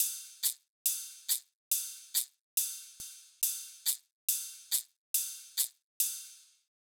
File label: Riddim Drum Loop 140 Top